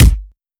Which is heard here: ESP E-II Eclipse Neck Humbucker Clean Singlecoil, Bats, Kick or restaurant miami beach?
Kick